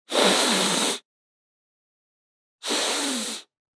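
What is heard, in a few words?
sniffling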